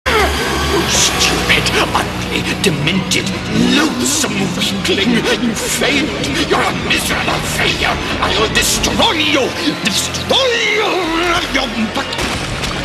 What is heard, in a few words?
failure